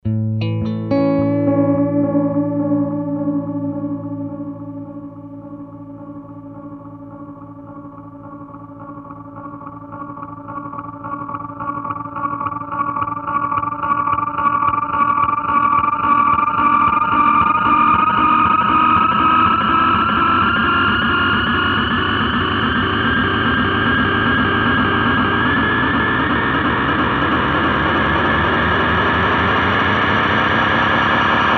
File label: Making noises